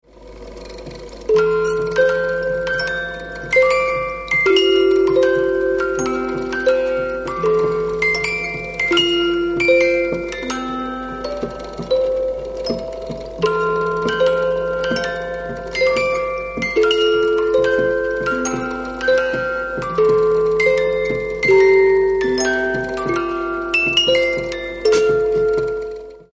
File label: thorens